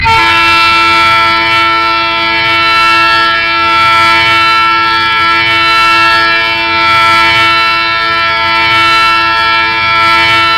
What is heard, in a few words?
Horn; Wdm 2a